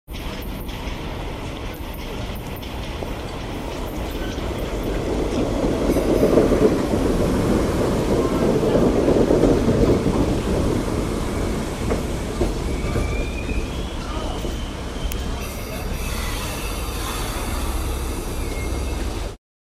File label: Train Pulling Into Station; Trains; Vehicles; Bobby Cole